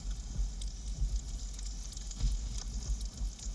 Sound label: Ambience Loop, outdoor